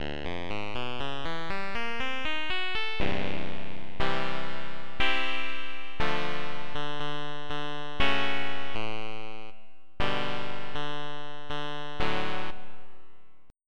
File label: Clav 2; Onj's Soundfont